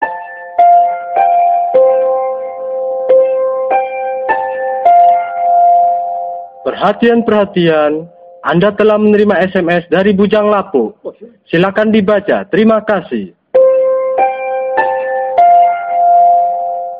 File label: indonesian sms ringtone; bujang lapok